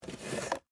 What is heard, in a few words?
Telephone Pick Up, Technology, Telephone, Pick, Up, Western, Electric, Bell, System, Phone, Antique